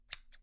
Scissors Shears

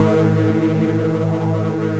Lead, Synthesizer Middle C Notes

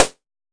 noise snare; single hits

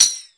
TAMBOURINE; Khezie Hip Hop Kit

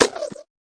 tight hit; Hip Hop; STYLE KITS (Hip Hop, Etc)

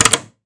Microwave Button; ModeAudio Found Percussion Hits

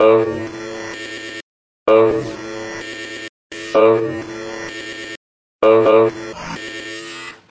loop uh; Ze Franks Pain Pack

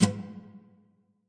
Nylon Hit, Guitar Body and String Hits

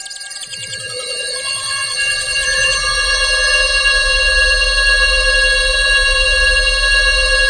Electro FX; Ueb HE Electro FX

